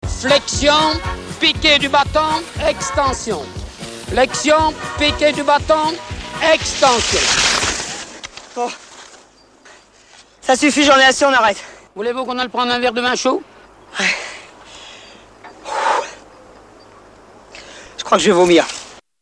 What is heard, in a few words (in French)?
Flexion extension piquet du baton; bruitage; Dialogues bronzés